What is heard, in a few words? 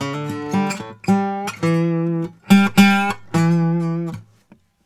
Blues Guitar, LICK; ILIO; Fretworks